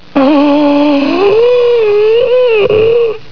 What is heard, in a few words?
inhale